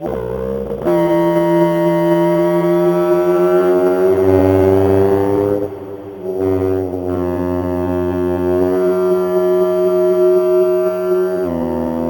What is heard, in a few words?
EVP; Sonic Foundry (Sony Creative Software); Loop Noir